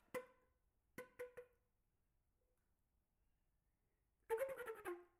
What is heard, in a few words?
Pizzicato